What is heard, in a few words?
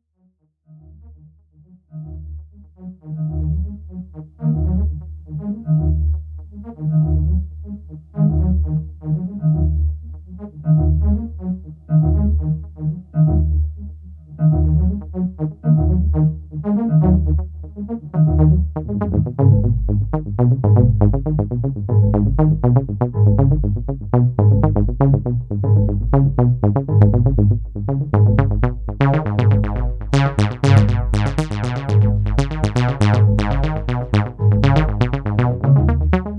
s3 analogue